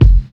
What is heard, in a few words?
low tone kick drum sound F sharp key; kick drum samples